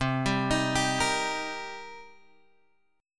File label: C Minor 7th, Guitar, Open G